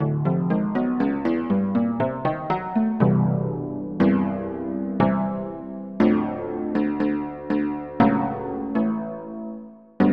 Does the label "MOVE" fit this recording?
No